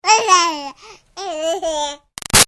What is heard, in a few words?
Baby 6 Month Old Talk Short Laugh; Babies